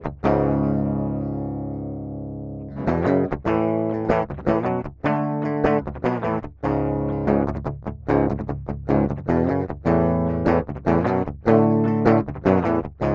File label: reamping